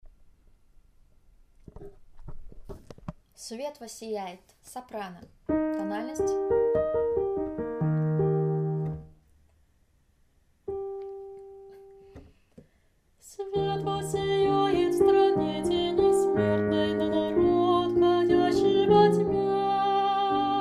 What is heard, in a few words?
Soprano; Svet vossijaet